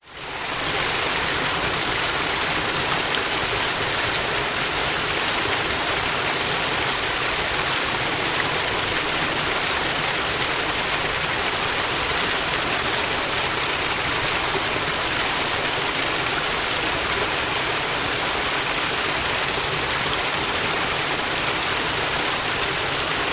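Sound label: nature waterfall medium forest; zapsplat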